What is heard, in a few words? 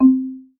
Marimba, notifications